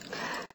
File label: Breath; Lewd SFX